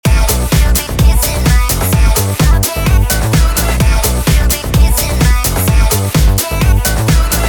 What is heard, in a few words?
stereo mix center